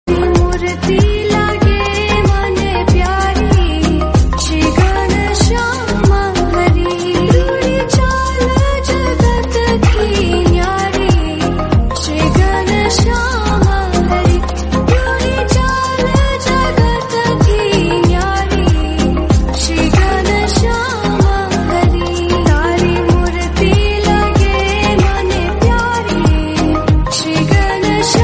hindi bhajan

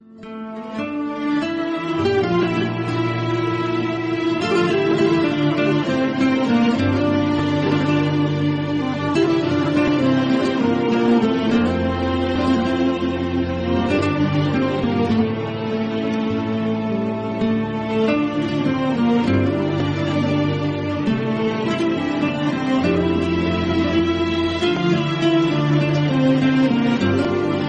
drama music turkish

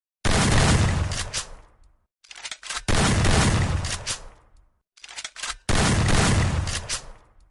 gun sound; usp; free fire

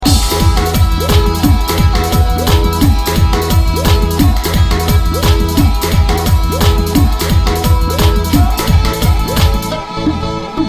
Dhadh Sarangi Instrumental

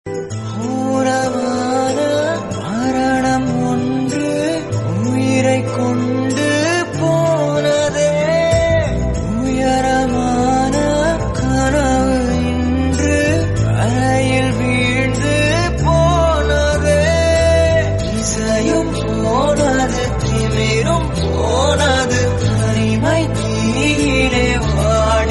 Hindi Tamil

